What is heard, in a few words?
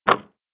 swish, basketball